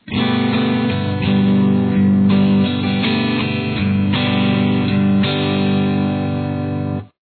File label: G add 9